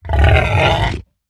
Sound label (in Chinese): 疣猪兽撤退声